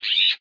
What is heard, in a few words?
Damage